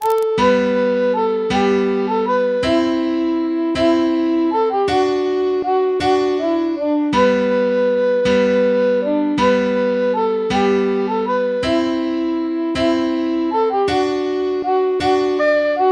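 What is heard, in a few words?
Pipers Waltz, MIDI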